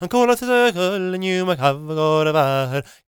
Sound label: GAELIC, World Voices; ILIO; Vocal Planet